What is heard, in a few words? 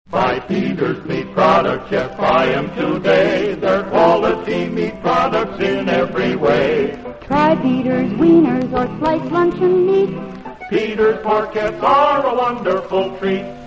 radio jingles; peters meats; allied radio artists